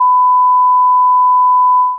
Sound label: 1khz; 44.1khz sample rate